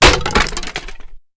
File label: Wooden Stake Break